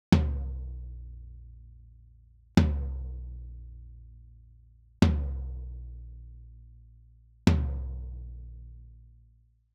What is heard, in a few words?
Tom; Daking